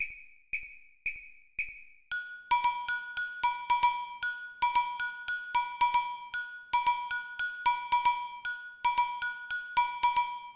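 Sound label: samba bells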